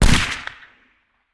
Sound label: Musket; Sound Ideas; Guns